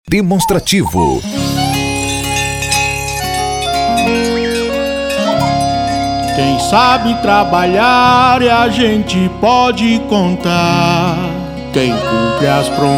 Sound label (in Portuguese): JINGLE